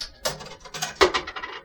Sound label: Coin